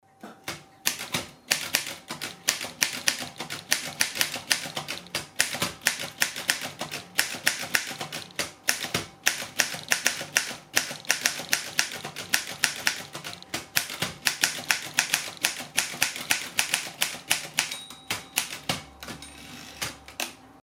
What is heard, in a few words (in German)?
groma modell n, Schreibmaschine; Hörbeispiel Groma Modell N